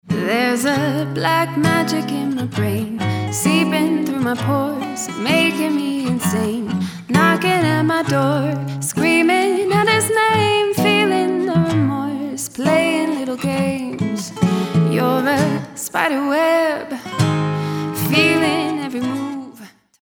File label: Black Acoustic